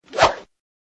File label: jump